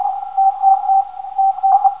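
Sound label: 409.5kHz, non-directional beacon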